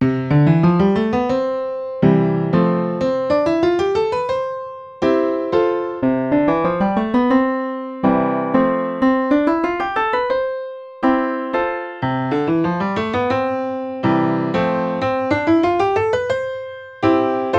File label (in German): Lauf C3C4 Uprights